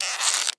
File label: bow; weapons